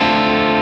C#m7

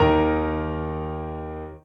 soft hitwhistle

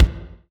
normal hit clap

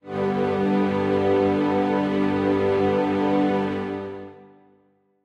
Strings end

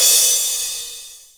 soft hit finish

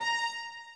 High Violin

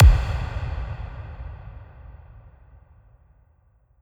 End kick

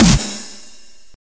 normal hit clap

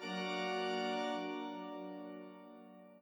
chord

